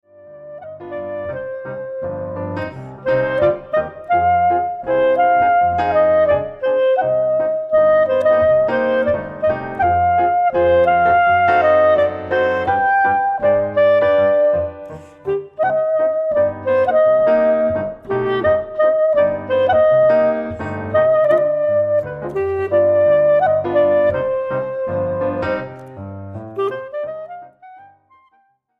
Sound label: klezmer goes swing